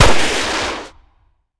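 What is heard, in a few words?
Weapon flare gun; Wpn flaregun 3d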